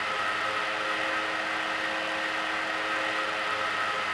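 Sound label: radar Dish loop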